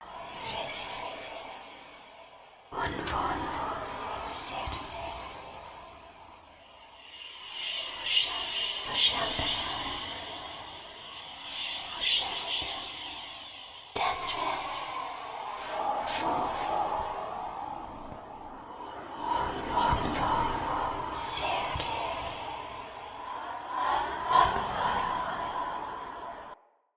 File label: Mystical Loop; New Player Quest; Pact Selection Empress